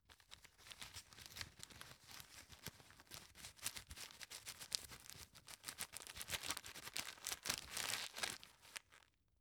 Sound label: tissue stuff in package